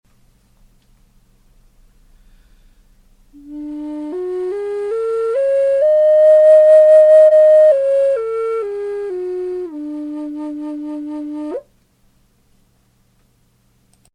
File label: fin D, native american flutes; silvermooncreations